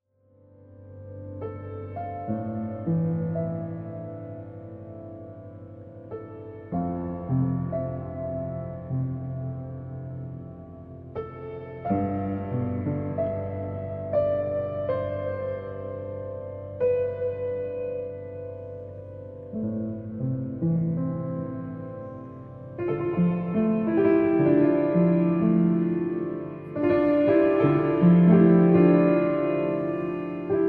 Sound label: instrumental